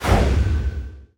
Level Up; rabbids multiverse; iOS, macOS, Mobile

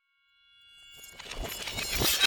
Maze Retro Game Place Photo

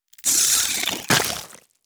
Cast Spit; Mars Sortie

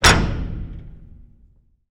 Circuit Breaker Switch Large

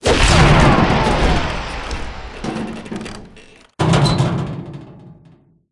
Basketball

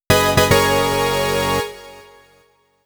Jingle - Rank A; Sphere Knight

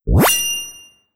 Shield

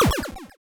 Magic hit; Syrup 2 Candy Alchemy RPG

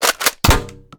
Toaster; Rabbids Multiverse

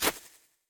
UI Remove

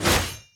Finalboss Attack

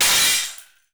Glass break; Audiosurf 2

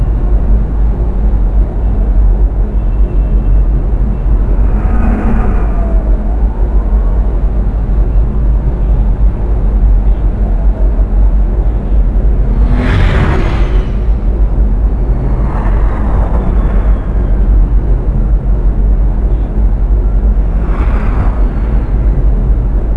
Amb Dino; Fur Fighters

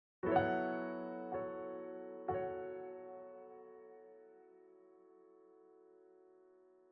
Factory Boss Piano; unsighted

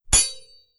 Sword

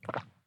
herb gulp

